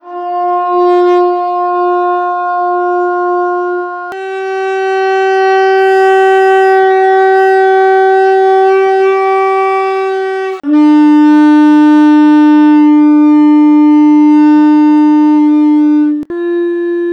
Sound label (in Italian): corno per telefonino